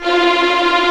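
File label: Vio tremolo F3; Digital Sound Factory Virtuoso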